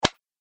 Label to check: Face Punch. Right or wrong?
Right